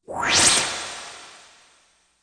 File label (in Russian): Stremitelny povorot s ehom